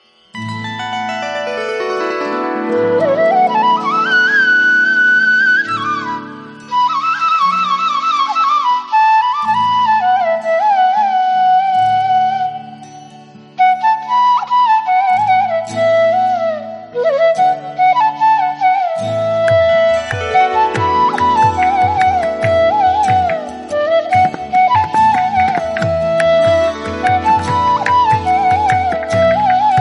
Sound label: flute melody; Religious